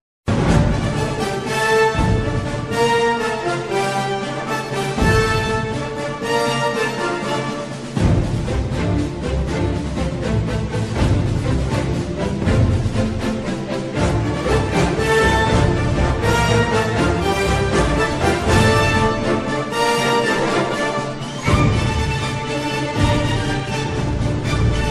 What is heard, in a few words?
movie bgm title ringtone background music; enthiran the robot; rajinikanth; robot chitti; 90s Ringtones & BGM